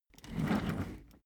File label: office chair trundle fast